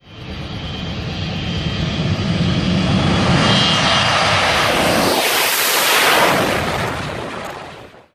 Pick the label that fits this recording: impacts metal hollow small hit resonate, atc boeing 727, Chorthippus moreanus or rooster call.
atc boeing 727